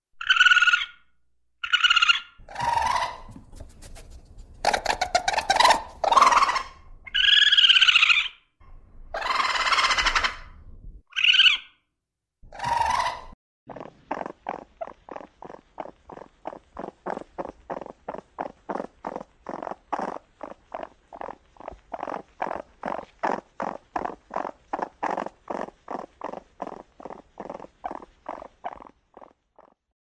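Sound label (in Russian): enot golos, raccoon voice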